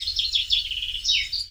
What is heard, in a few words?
bird; gmod; garrysmod; airfield